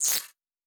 Spark, Electric

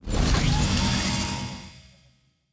Door, Sci-Fi Sounds

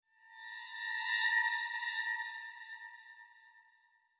Suspense Transition, Horror Sci-Fi Music; Dead Ark

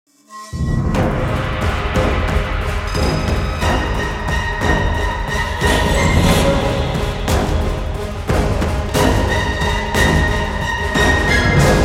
Action FULL 180BPM, Classic Horror Sci-Fi Music; Dead Ark

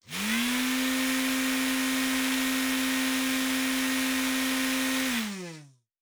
servo big, sci-fi sounds, mechanical

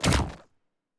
Weapon Shoot; Sci-Fi Sounds

